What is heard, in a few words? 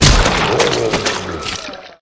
die boss; Bile Lord